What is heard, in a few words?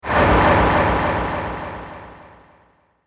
Sturdy Sweep Delay, whoosh